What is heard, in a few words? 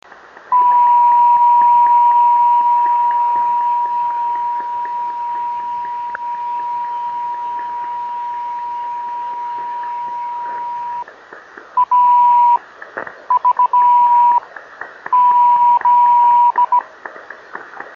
26630KHz